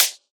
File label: present high hat D key, closed high hat sound tuned to the D note; hat samples